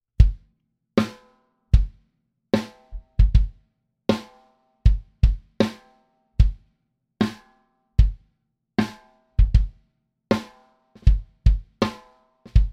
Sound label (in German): Snare und Kick Dyn